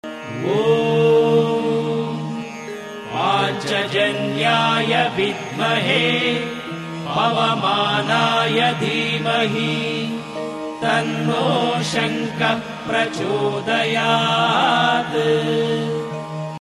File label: shankha gayatri